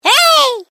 Created Creature Small Hooray, Alien Sound Effects; Created creatures, vocalisations and pretend speech